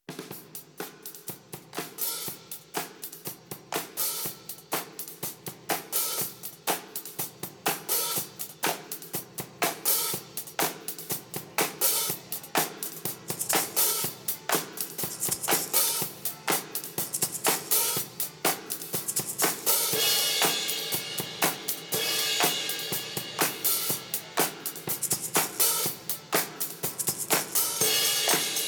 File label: drums